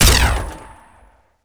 rifle plasma; weapons

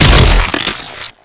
spluck

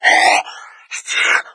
chatter combat, hazmat zombie; doom3

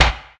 backfire damaged, vehicles

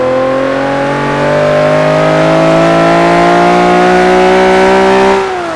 third, vehicles cars; v12vantage; tdmcars

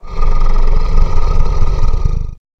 dragon voice

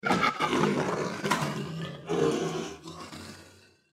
deathclaw chase; npc

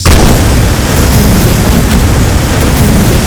flamer start, flamethrower; kf2; weapons; trapper

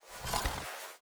arrow fetch, bow; bow deerhunter

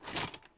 armor

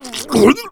male boomer disrupt vomit

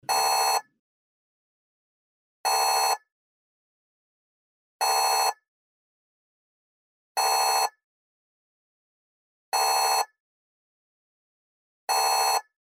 minor alarm, jet vehicle

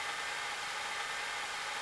idle, weapons; howitzer; tfa cso

